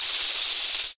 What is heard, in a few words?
snake